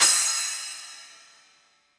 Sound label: Splash 8 Studio Basic, Cymbal; Drum Hits; Standard Hits; Ableton; Factory Packs; Session Drums Studio